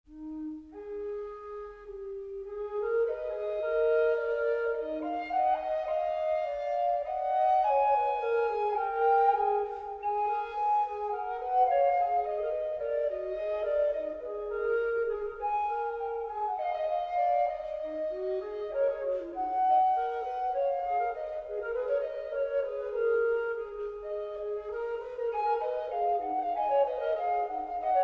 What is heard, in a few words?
Tenors; Berlin; Morley